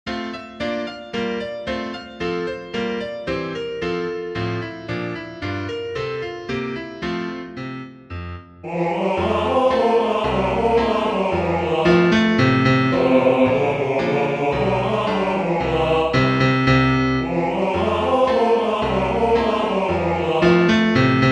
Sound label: when a felon chorus